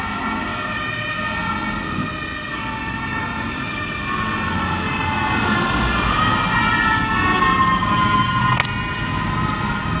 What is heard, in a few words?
siren; Нарастающий звук полицейской (милицейской) сирены